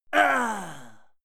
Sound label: male fight attack moan; schreie; menschen; soundpool